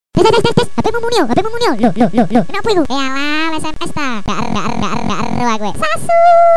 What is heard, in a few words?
SMS